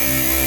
Robo Fuzz

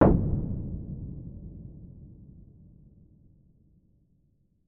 Small Far Explosion

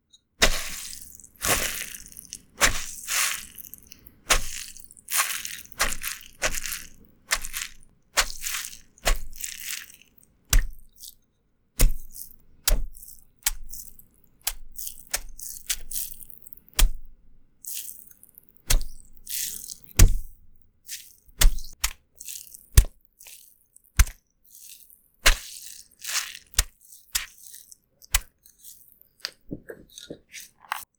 Squishing And Squeezing A Wet Sponge In A Bowl

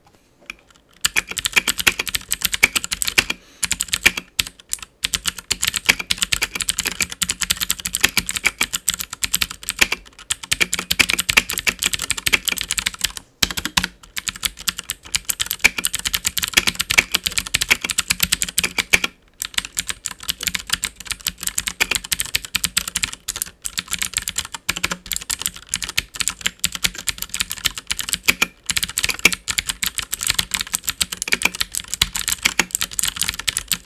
Keyboard Typing Blue Switches

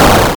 Explosion

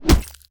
Cyber Punch

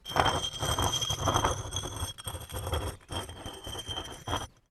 Rubbing Stones Cailloux Frott S

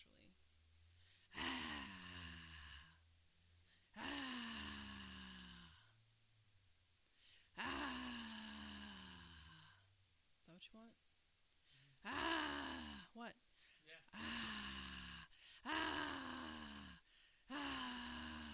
Woman Growl

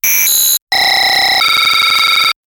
OSD Error

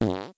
Fart Short

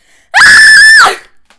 Girl Scream

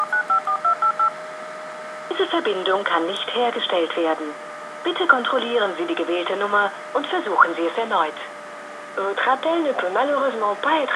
Error Dial Answering Machine

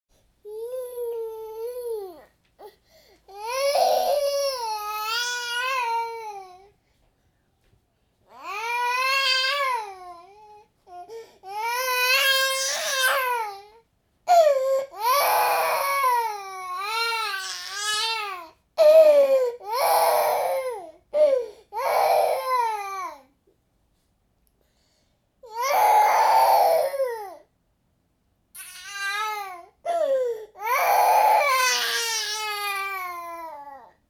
Baby Cry

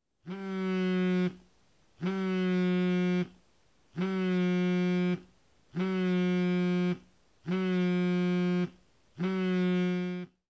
Phone Vibrate

